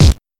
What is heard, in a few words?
old school puffy top end bass drum c sharp key; kick